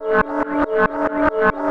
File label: TECHNO EXTRA 140